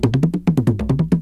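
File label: MUTUMBA, AFRICAN RHYTHM; Crypton - DrumBase5000; AFRICNRHYTHM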